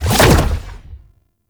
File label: Teleport Reappear; Enemies; Abilities; Warframe; Tau; Dax Ninja